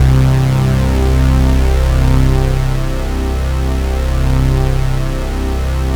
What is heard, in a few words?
MKS BASS